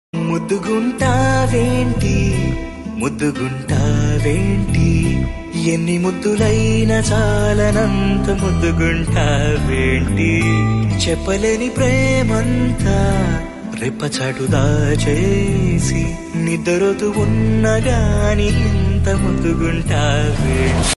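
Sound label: movie background music ringtone; telugu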